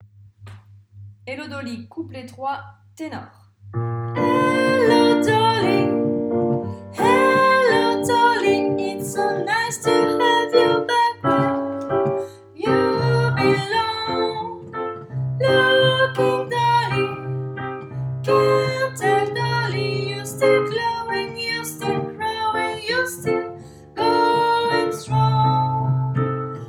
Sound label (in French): couplet 3 ténor; pupitre tenors; enregistrements de travail